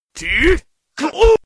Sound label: slow time; freak fortress 2; dragonborn